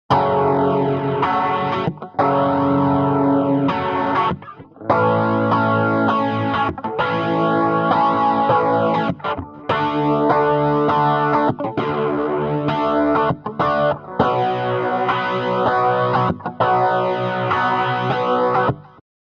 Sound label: rotary speaker guitar wet